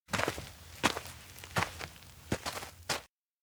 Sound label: steps outdoor schoolyard walk few; anemoi